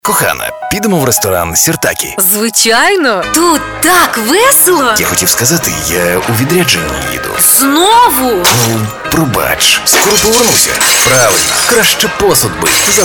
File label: Sirtaki